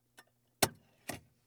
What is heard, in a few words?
hand brake clicks ebrake, Mercedes Benz 280 SL